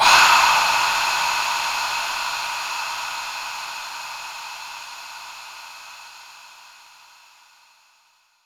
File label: Reverb Athmospheres, FX Vocals; Vengeance Samples